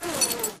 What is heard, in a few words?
zipline detach; Titanfall 2